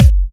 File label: Kicks; Vengeance Samples